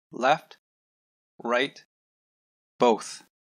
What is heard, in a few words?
stereo test